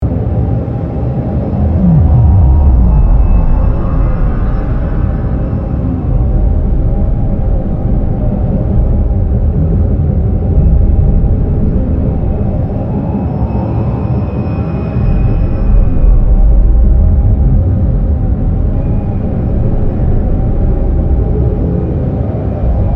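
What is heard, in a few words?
Ambience Lower Hall; Amb Eudora Lower Hall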